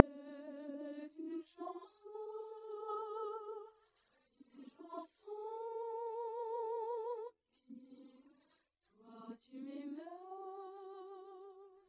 singing

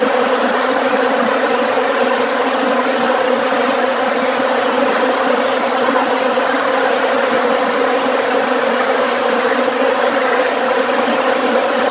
bees

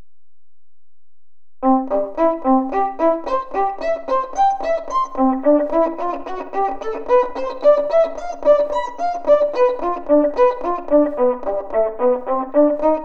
Staccato varying

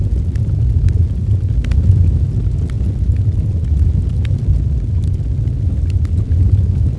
fire

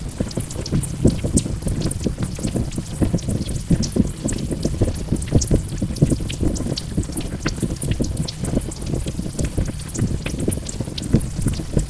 fire

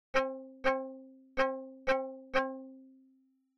Inharmonicity

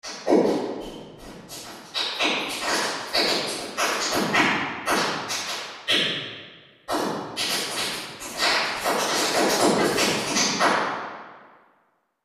Large Hall Bright; .mp3 file - wet = dry - 26.7dB*; freeverb

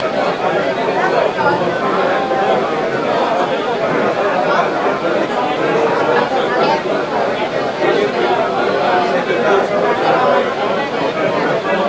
crowd

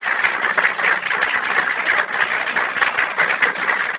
applause

